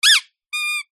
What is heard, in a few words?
leisure toy squeaky